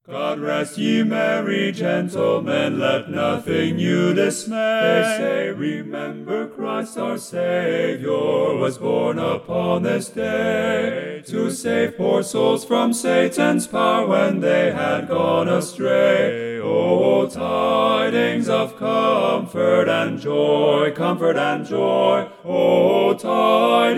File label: Lead left, tracks ttbb; God Rest Ye Merry Gentlemen